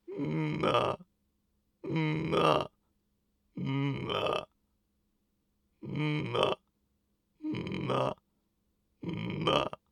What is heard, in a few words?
inspiration chest voice